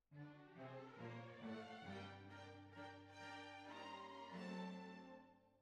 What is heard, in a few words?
Cbasses